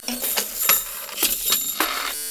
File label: slinky loop; Rarefaction; Poke in The Ear With A Sharp Stick